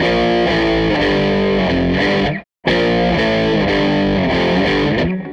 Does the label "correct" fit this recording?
No